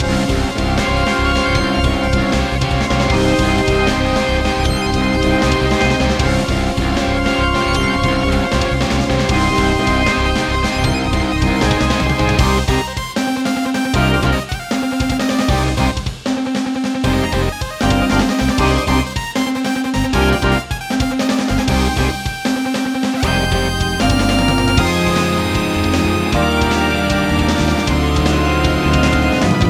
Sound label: Boss Battle Classic; Phoenotopia Awakening